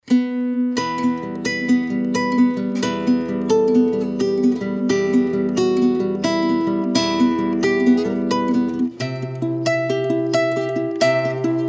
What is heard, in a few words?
Classical Wedding Ceremony Seating, acoustic guitar; Romance D Espania